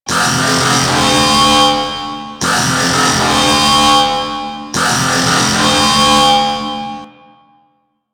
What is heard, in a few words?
Enemy Hack Loop; Warframe; Entrati; Necramech Hack